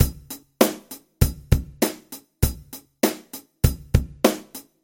8beat basic

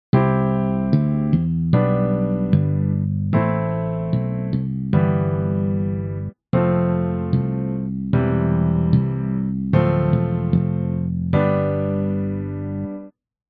bass

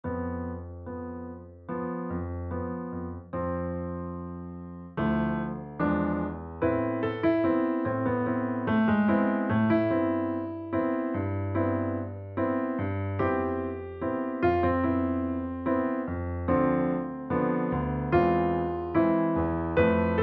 ballade

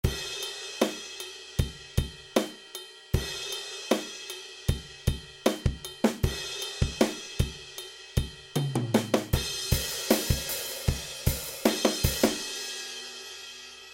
drum

